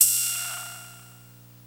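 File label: Hat Open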